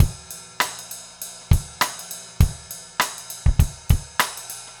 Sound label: basic funk 100 rims